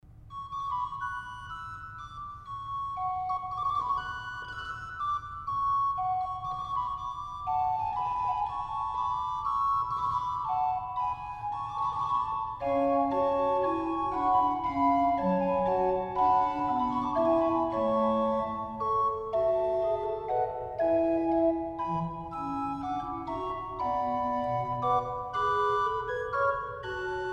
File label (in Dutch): Sonate in g kleine terts